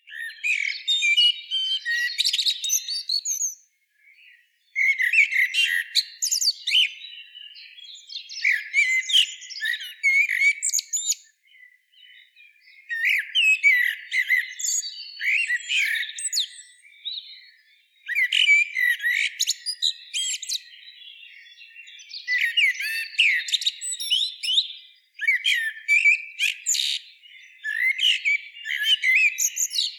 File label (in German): Amsel Turdus merula